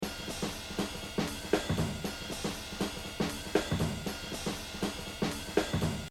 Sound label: Exercise, jazz drumming; Exercise 21 Buddy Rich - 100; The Evolution of Jazz Drumming (Gottlieb)